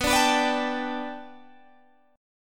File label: B Minor 7th Sharp 5th B Guitar Open G, chord